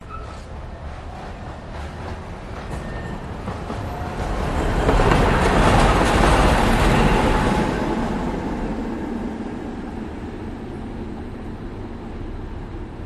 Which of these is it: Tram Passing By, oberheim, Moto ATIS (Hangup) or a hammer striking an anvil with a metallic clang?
Tram Passing By